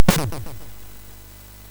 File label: SFX, MOS6581; Narco Police; MOS6581R4; hvsc